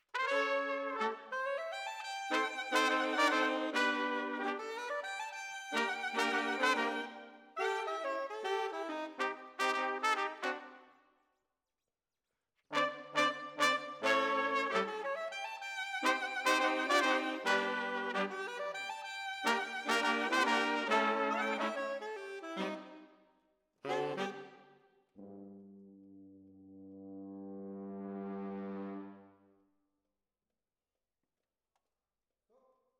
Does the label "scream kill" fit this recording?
No